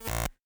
Glitch

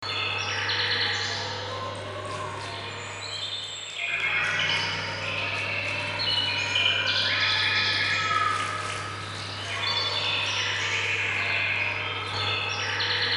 Village Ambience; Observing The Fauna

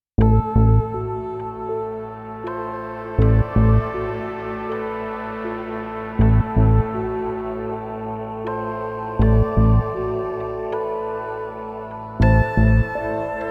Epic Open; dying light the following gamerip 2016

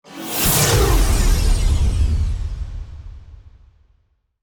Attack; Yu-Gi-Oh! Master Duel

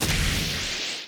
white fly stun

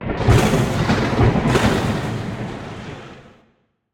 Big Monster Spawn Thuders

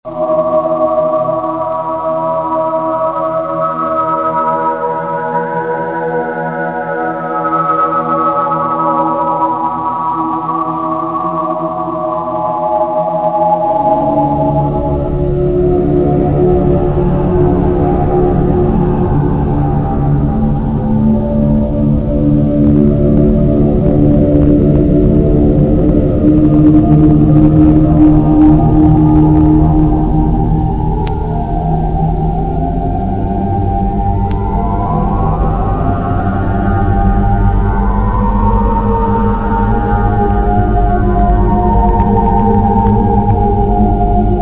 Labrynith Ambience; dragon lore

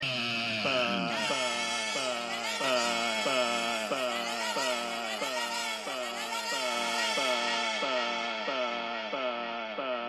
Vocals; PixelJunk Shooter; PS3

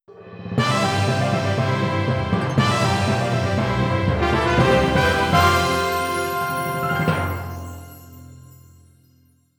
Battle Victory!; Little Town Hero

